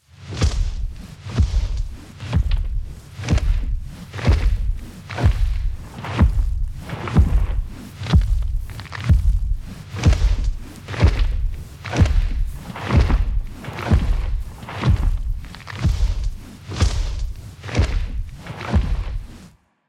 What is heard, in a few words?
Forest Giant Walk Through The Forest With Swishes